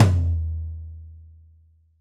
New Jazz Tom Low, Roland BK-5

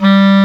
clarinet G2; InVision Interactive; Lightware VOL-1; Instruments & Percussions

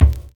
300 HZ

